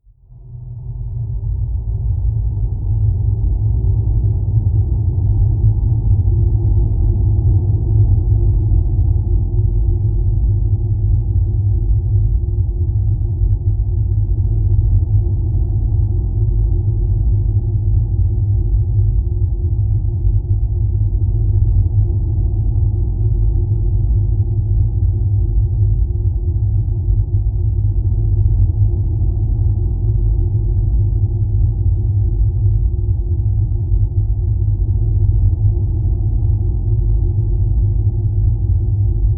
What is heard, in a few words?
drone tumbler